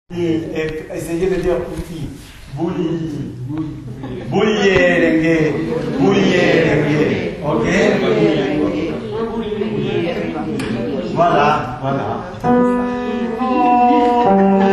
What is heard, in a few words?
BULLERENGUE